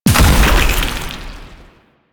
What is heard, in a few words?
Octopede Oblivion Proj By Explosion; Warframe; Man In The Wall